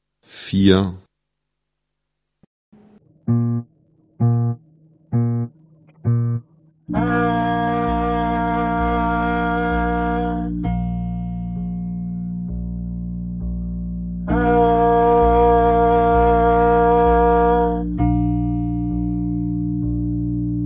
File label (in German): Klang, Hornschule; Ansatz